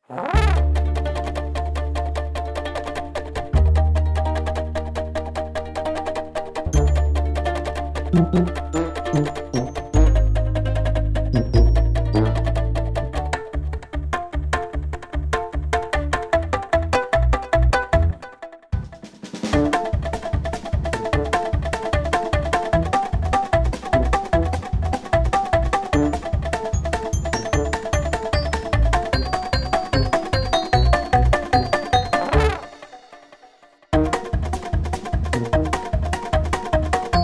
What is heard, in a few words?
ronroco